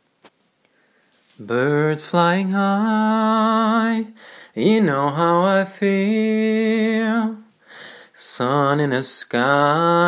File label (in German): Gesang Englisch